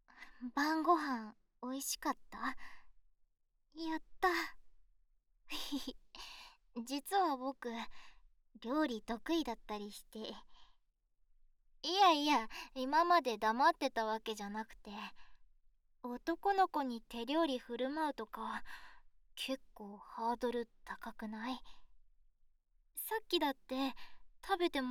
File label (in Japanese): 生えっちとこれからのこと(耳舐め／本番)